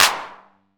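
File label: 909 clap; Wizoo - Platinum 24 Electronic; 909 TINY P2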